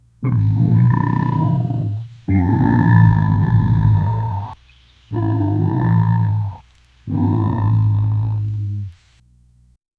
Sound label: distant hadrosauridae her; film